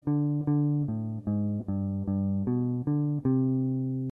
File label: bass